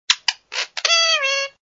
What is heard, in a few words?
Bird Entry; moonstone madness